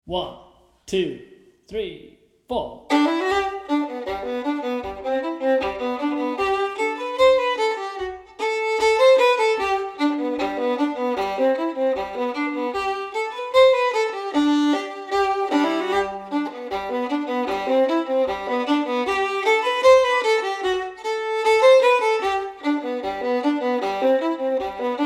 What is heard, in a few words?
Fiddle Steady; Old Morpeth Rant